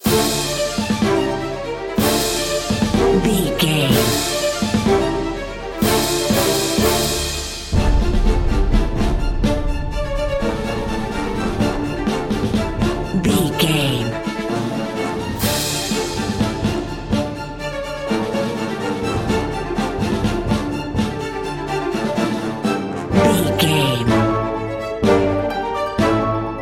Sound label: time running out